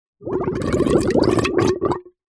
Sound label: water pipe gurgle; nature